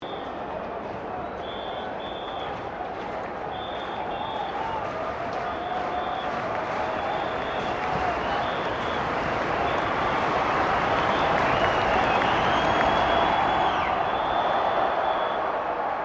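crowd 40K Melbourne Cricket Ground ambience medium, Cricket at Melbourne Cricket Ground; Crowds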